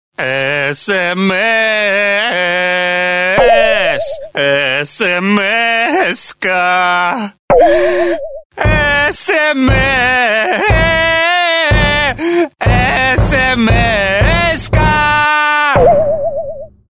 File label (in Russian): Sms v stile Oy moroz-moroz